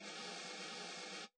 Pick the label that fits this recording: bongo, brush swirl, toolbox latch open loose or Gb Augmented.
brush swirl